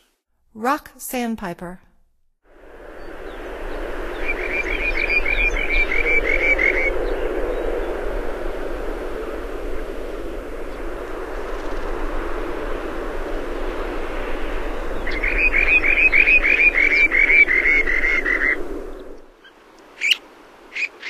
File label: Rock Sandpiper; North America; Stokes